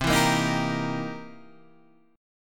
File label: C 7th Sharp 9th, C, down, Guitar, Open G